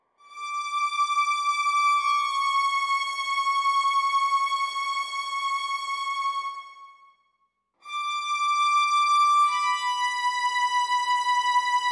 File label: Violin; Verdigris Music